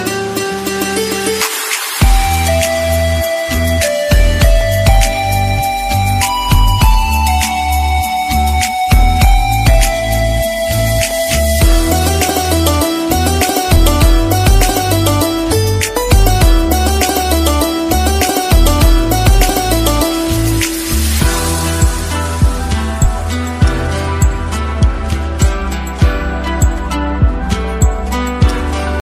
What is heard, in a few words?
instrumental love ringtone, bollywood; bollywood ringtones